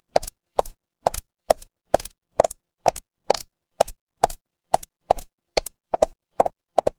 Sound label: footsteps heels